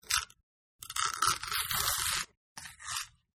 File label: Man Peeling Banana; Eating (Sound Effects:Human)